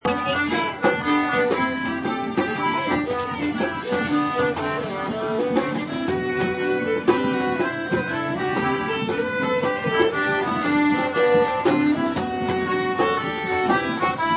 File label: medieval melody